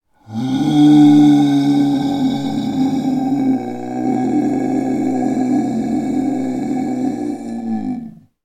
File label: horror monster zombie male groan; sound effects one